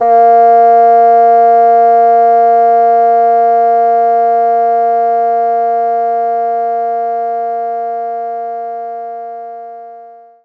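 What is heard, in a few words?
Bassoon A sharp 3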